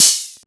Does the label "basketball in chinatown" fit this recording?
No